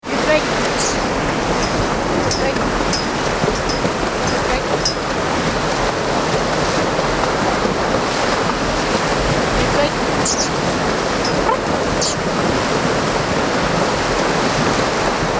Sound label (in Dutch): trekpaard zee